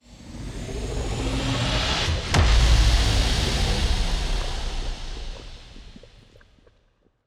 toxic megastructure hit, weapons; Stellaris; toxoids

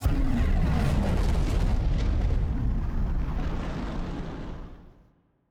kaiju superweapon fire whoosh; biogenesis

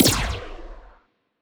wpn evasion buff muzzle; biogenesis

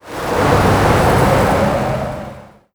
artic; ambient; Stellaris

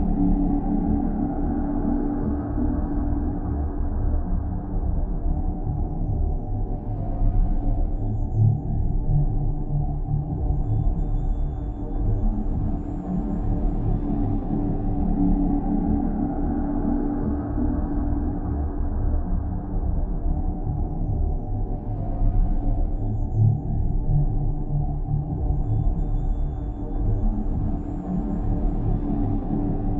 ship habitat idle; Stellaris; machineage

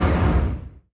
distance missile hit; weapons; Stellaris

